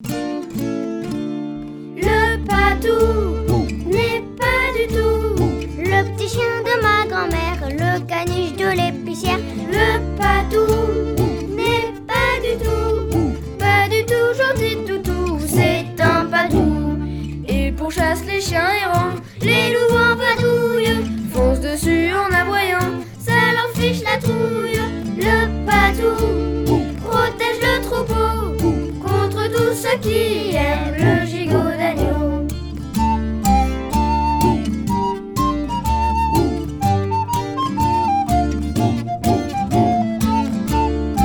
Monsieur Patou Chanson

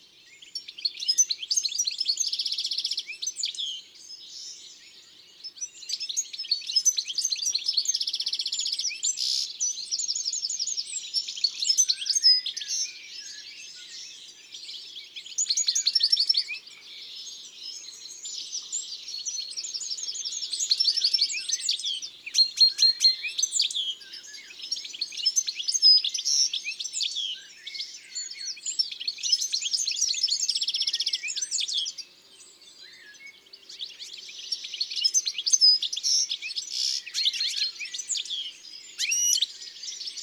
Chardonneret elegant, chants d oiseaux; ABC des chants d'oiseaux

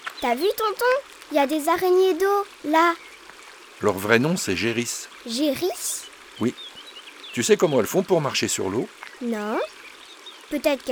Une balade au bord de l'eau Les gerris; Studio les Trois Becs

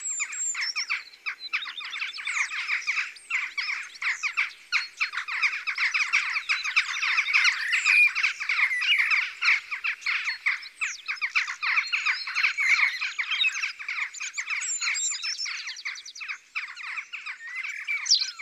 Choucas des tours; ABC de la nature

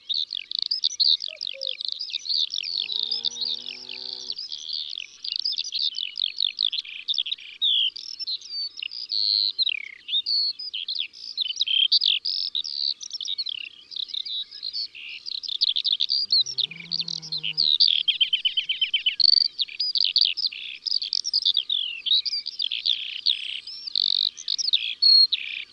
Alouette des champs Chants d'oiseaux dans la campagne

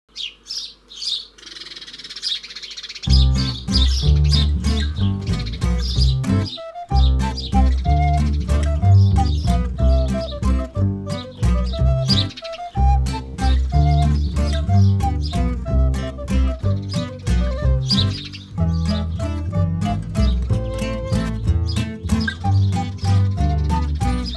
instru; cuicui et compagnie

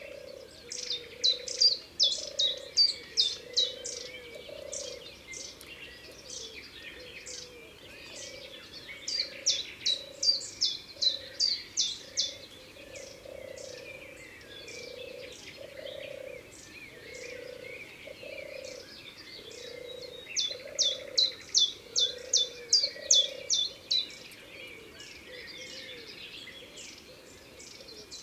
Pouillot Veloce; Ardeche; Concerts Naturels De l'Ardeche

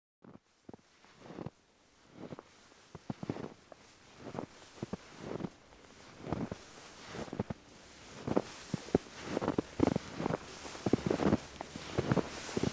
50 cm de neige fraiche Lachamp Raphael Ambiances Sonores d'Ardeche; Studio Les Trois Becs

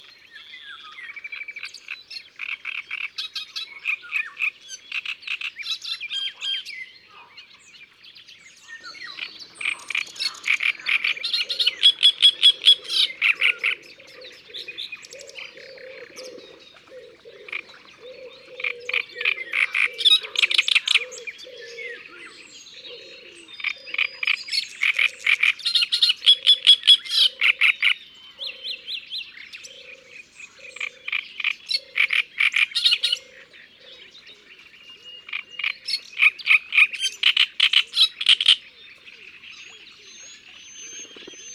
Rousserolle turdoide; ABC des chants d'oiseaux

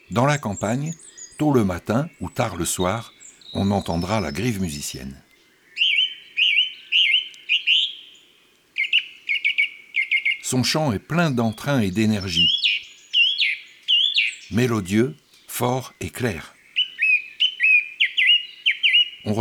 La Grive musicienne; chants d'oiseaux

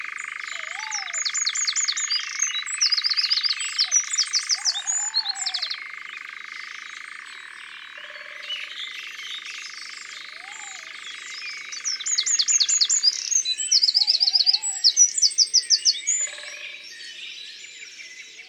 Troglodyte mignon; abc de la nature

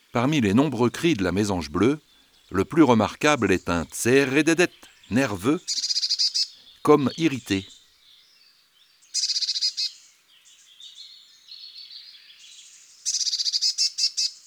Mesange bleue, Oiseaux des jardins

